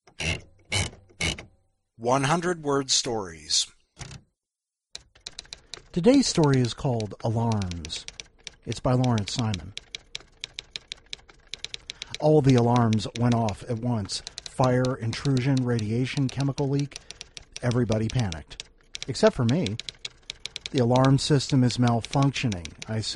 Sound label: alarms